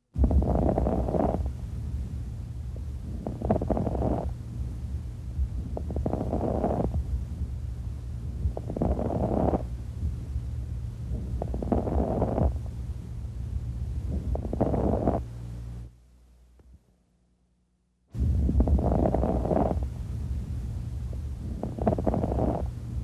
Crepitantes finos base pulmonar

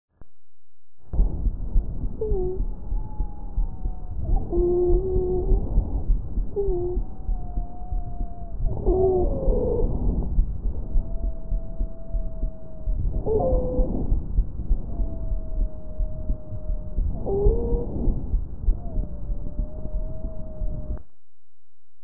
Sibilancia Adulto

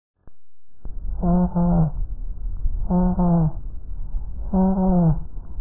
Neumonia transmicion voz

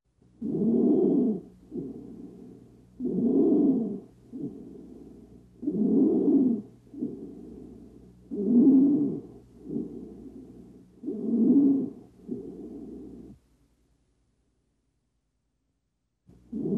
Estridor inspiratorio tráquea

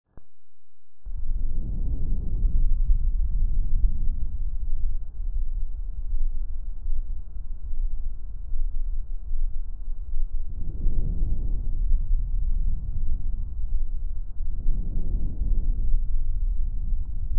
Pulmon Adulto

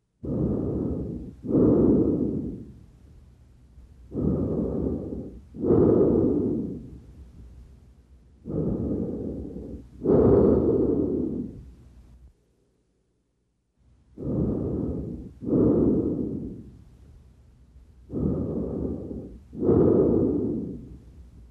Ruido normal de tráquea tráquea torácica

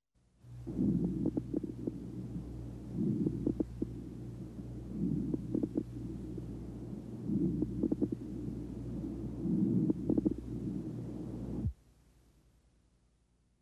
Crepitantes fuertes lób inf dcho

